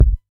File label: warm kick drum one shot a sharp key, bass drum single hit tuned to the A# note; kick drum samples